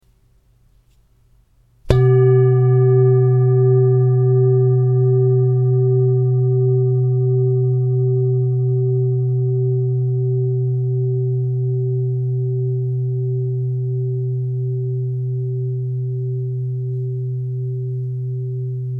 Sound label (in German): Klangschale